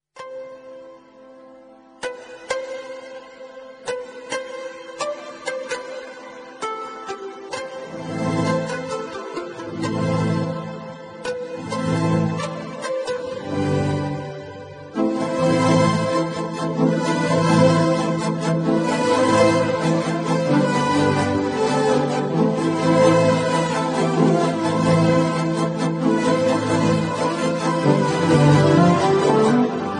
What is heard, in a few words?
Heart Touching Instrumental Ringtone